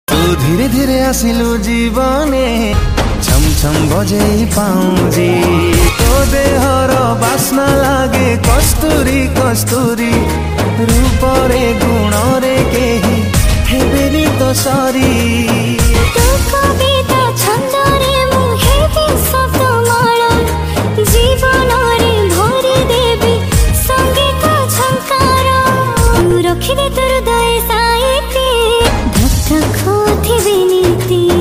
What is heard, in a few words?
Odia Love Song Ringtone